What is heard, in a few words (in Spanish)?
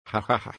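jajaja, laugh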